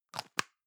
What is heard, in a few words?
plastic can lid screw close medium; objekte; dose